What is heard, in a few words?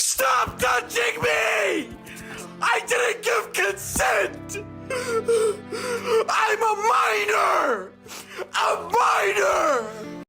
click